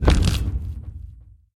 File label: Sound Hero unlocking; Peace, Death